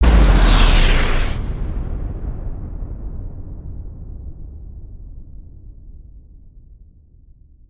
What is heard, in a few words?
Tornado Mid Blast